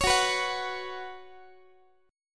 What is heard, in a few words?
Gb Suspended 4th Gb, down, Guitar; Gbsus4 strummed; x,x,16,16,15,17